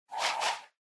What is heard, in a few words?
Motion Swish Low Short